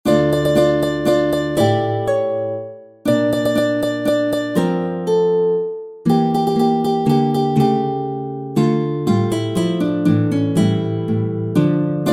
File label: Minuet, Valse, Classical guitar; Dionisio Aguado; Op. 4 Six Petites Pièces